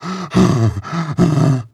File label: breath long, zombie